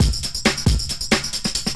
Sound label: drum loops; bb drum loops